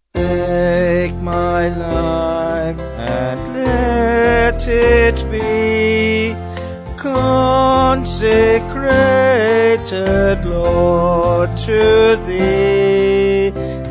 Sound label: Vocals Band; Take My Life; Nottingham